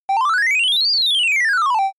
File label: general rise and fall short soft